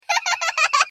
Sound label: SILLY LAUGH